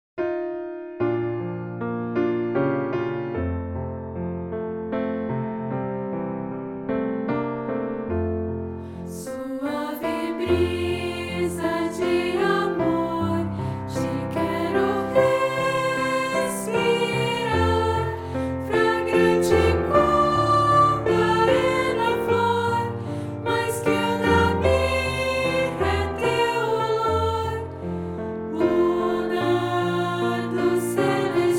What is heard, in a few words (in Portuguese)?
voz piano